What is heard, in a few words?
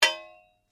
Blade Heavy Moving Impact, Sword